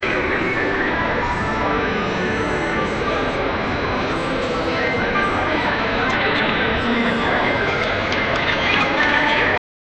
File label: airport sounds